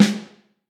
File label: Vintage Snare Drum, 5.5X14; Q Up Arts; Johnny.C's Vintage Snare Drum